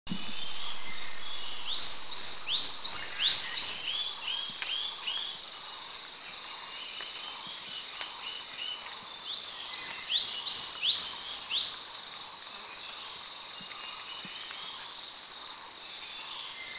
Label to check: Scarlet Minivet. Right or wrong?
Right